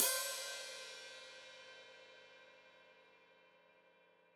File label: Hat Foot Open, Hi-Hat; Deeply Sampled Drums